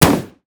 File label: bullet